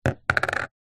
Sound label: money coin set down on cardboard box; zapsplat